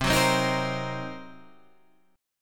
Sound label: C Minor 7th Flat 5th, Guitar, Standard; strummed; capo 3; 8,9,10,8,11,8